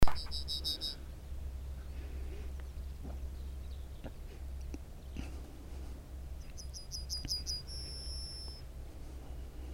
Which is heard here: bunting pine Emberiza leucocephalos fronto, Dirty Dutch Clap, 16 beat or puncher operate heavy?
bunting pine Emberiza leucocephalos fronto